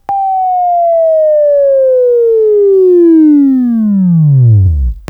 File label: Buzz, Sweeping Training